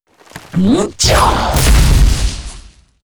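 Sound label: ghost attack, monster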